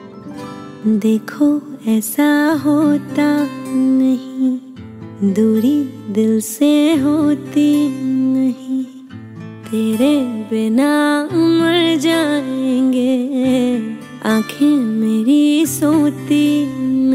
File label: Romantic Version